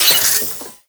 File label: Moa Fabricator Spawn Activate; Characters; Warframe; The New War; Veso